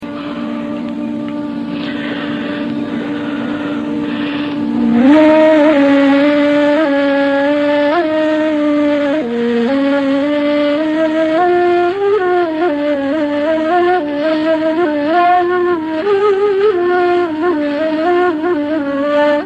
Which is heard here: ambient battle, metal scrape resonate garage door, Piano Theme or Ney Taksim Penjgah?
Ney Taksim Penjgah